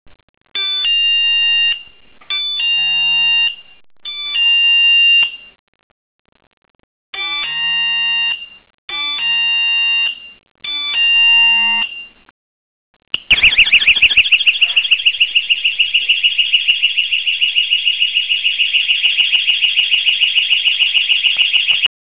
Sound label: doorbell